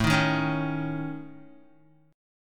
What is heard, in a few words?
A Major Flat 5th A Guitar, chord; strummed